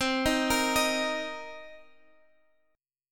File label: C Minor 7th C; Cm7 arpeggiated; x,15,13,15,16,x